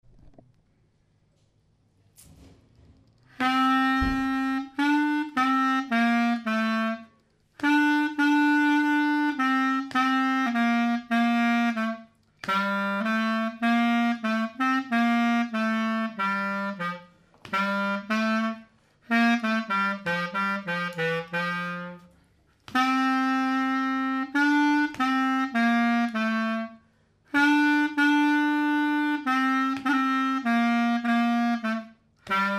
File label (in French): grec lent, clarinette; stg clarinette